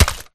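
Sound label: impact bullet generic headshot human; Titanfall 2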